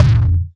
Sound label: Kerazy Kick; Drum Hits; Kicks; Loopmasters; Jon Carter & Alex Blanco; Block Party Essentials